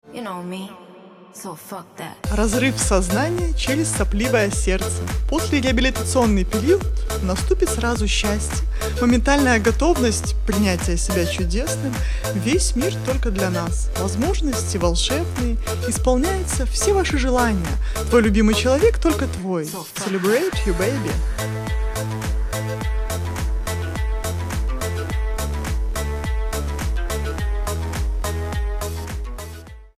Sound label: LAUGH